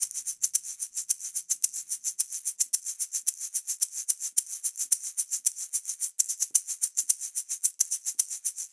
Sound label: afro shaker loop, 110